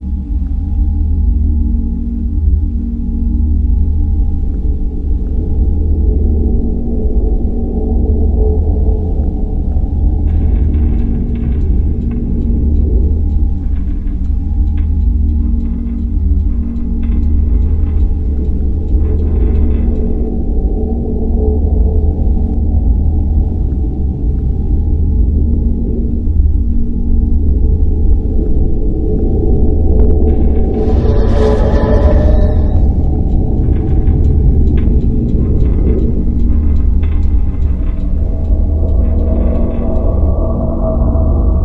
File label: hum, tardis; p00gie